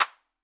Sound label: clap; MFB-522